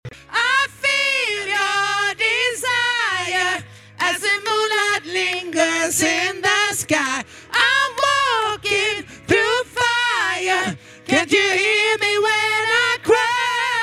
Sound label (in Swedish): sopran